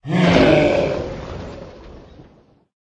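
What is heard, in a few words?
crystal golem death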